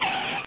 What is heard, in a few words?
skid